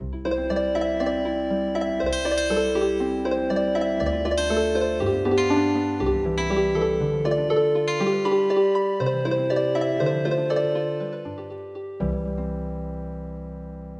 Healing Harp

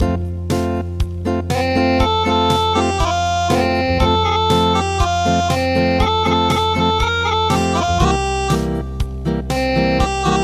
Acoustic

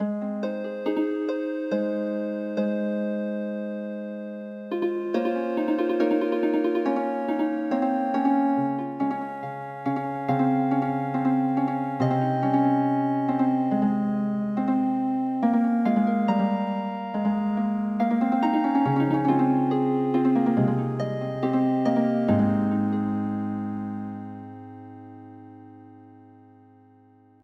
Dance Healing Harp, traditional; Tippani

